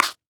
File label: Foley Footstep High Heels Skid On Cement; Foley Footsteps